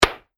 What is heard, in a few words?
Solid Wood Hit, slow delay; Miscellaneous Impact Sound Effects; Andrew Fleming